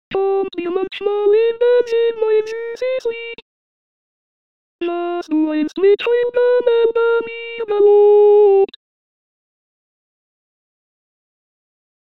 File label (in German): Zigeunerlieder alto